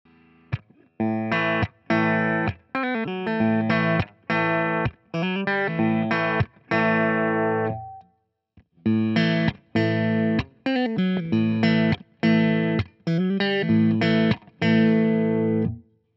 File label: Fender PP Nashville Tele Push Pull Pos. 2 Clean